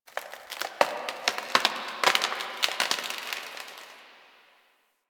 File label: Wood Creak; Warframe; Quests; The New War; Ambience; Zariman